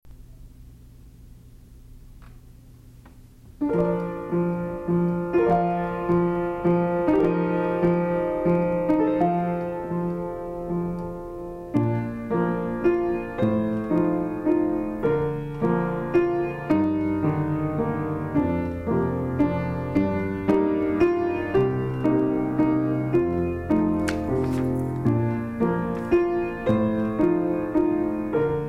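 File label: accompaniment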